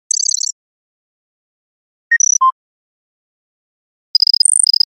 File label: Digital audio signal